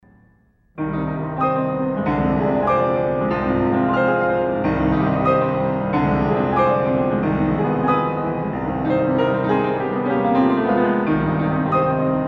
Etude for piano in C minor; Chopin; Etude for piano No. 24 in C minor ('Ocean') Op. 25-12, B. 99-2

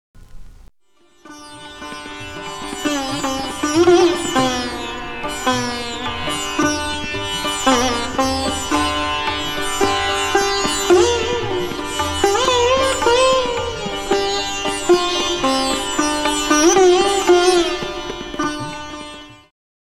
Far Eastern Music; Halloween Sound Effects

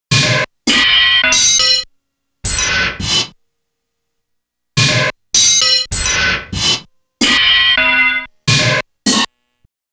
Crashes & Bangs; Halloween Sound Effects

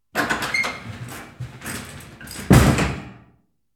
Dungeon Cell Door; Halloween Sound Effects

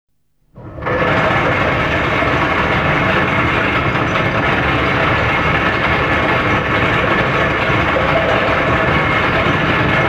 Big Chains Dragging; Halloween Sound Effects

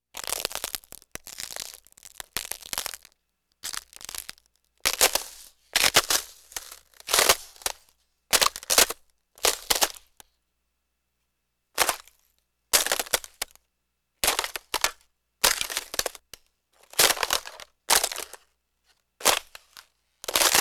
Ice Cracking; Halloween Sound Effects

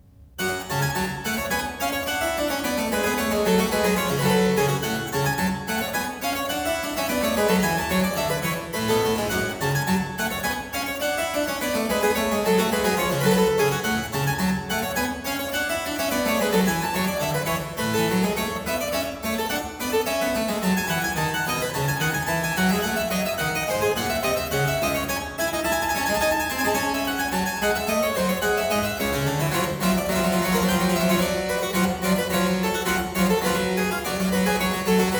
Suite No.3 in G minor, Gavotte I, Keyboard Works; J.S. Bach; English Suites; BWV 808

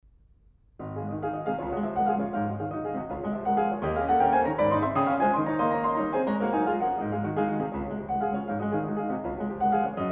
Etude for piano in D flat major; Chopin- Etudes (Complete)

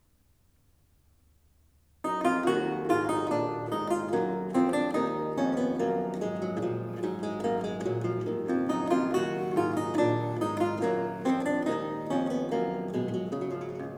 Lute; J.S. Bach; Suite in E minor BWV 996; Bourrée; Lute Works

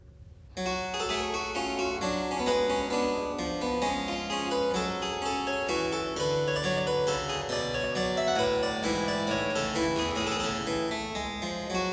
Menuet in G minor, Keyboard Works; J.S. Bach; BWV 842; Johann Sebastian Bach; Inventionen & Sinfonias

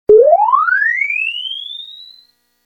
Small Spaceship; Halloween Sound Effects

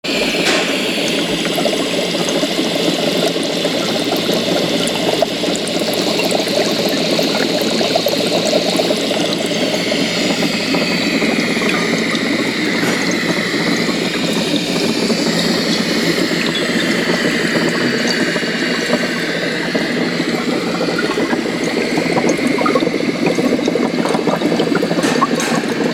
Laboratory, Halloween Sound Effects

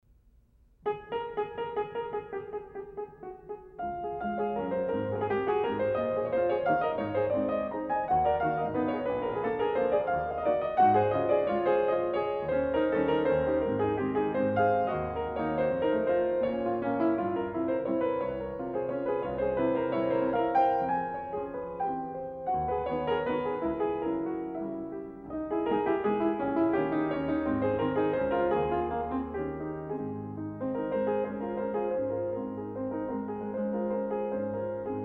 Etude for piano No. 26 in A flat major; No. 2 of 'Trois nouvelles études'; B. 130-2; Chopin- Etudes (Complete)

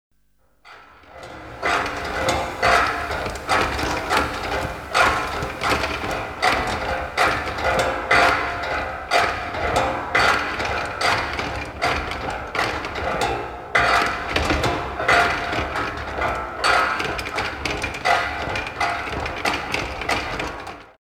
Monster Chewing Bones, Halloween; Halloween Sound Effects

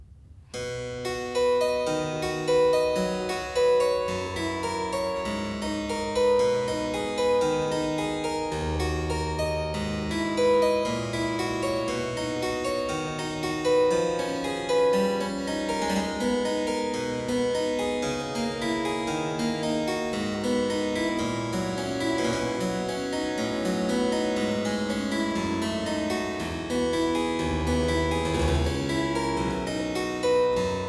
Praeludium in C major, Keyboard Works; J.S. Bach; Praeludium ex C; BWV 824a; Johann Sebastian Bach; Inventionen & Sinfonias

